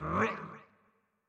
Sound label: Attack; Going Under; Dinkubus